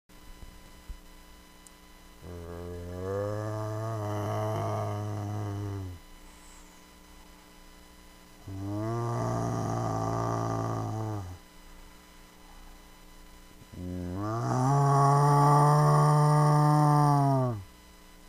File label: Zombie